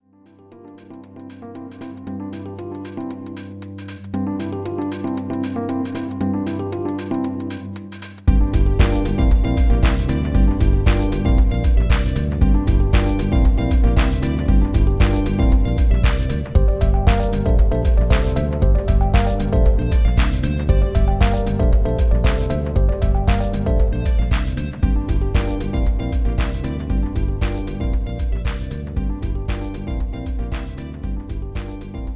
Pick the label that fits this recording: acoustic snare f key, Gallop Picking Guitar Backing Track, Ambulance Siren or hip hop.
hip hop